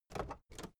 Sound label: car camaro open door